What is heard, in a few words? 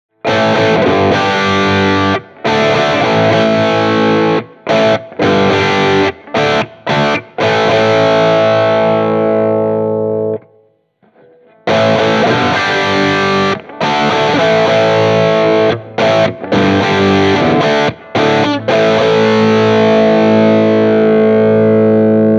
crunch